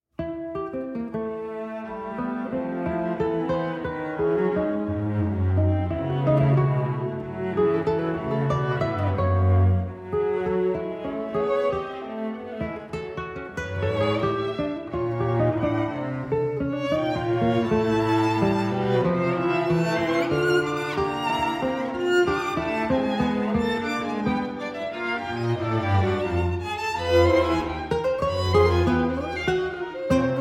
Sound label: clarinet sonata grazioso